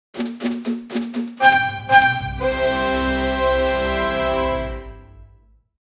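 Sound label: cheerful and energetic, fanfare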